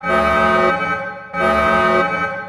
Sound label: Alarm; FoS